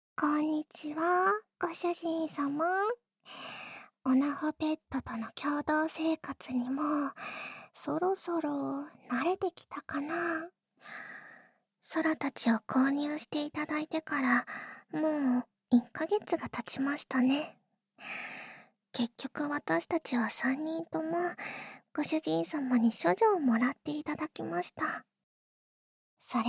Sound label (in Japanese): ハーレム耳かき♪; 猫耳オナホペット三姉妹を買える未来がやって来た!; ダチュラスクリプト; キャットシスターズ